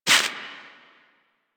Impact Generic; Warframe; Caliber Chicks minigame; weapons; hit effects

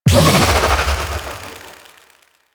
Fairy Light Impact; DE; Warframe

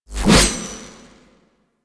Hatchet Whoosh; Crp Venus Hatchet

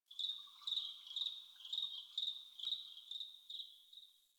Tree Critters; Warframe; Duviri

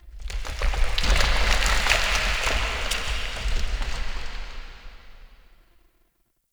Rock Movement, Asteroid; Ambience; Warframe; Grineer Asteroid

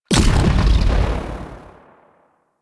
Fan Shrapnel Projectile Burst; Warframe; Warframes; Protea

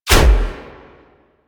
Dash Impact; Powers; Movement; Warframe; Focus; Operators; Wraith

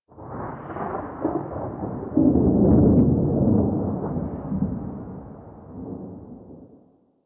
Thunder One Off, ambience; Warframe; Sacrifice; Vitruvian Memory

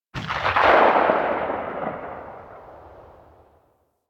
Heavy Shotgun Tail; Weapons; Warframe; Tenno

